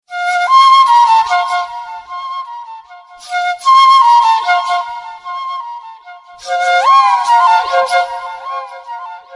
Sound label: flute loud classic; classical